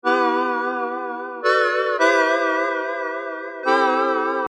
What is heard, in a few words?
Keys; PatchFactory